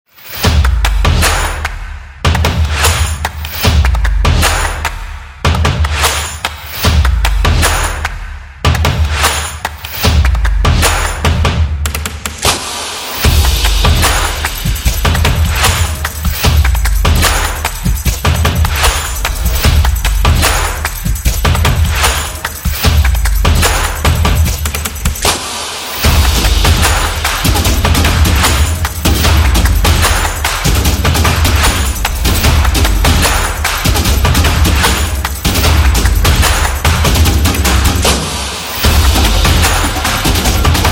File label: Upbeat Stomp